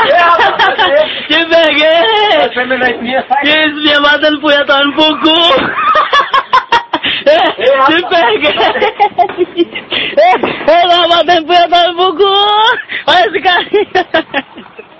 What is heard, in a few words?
haha